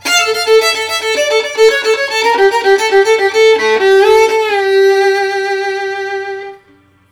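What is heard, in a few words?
VIOLIN LOOP, Asian Traditions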